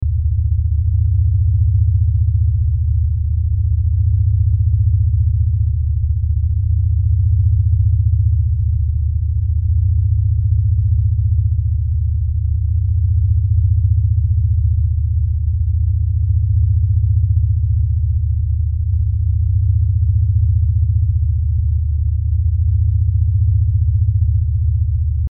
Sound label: Lfe Pulse Low Bass; Blastwave; Sound Design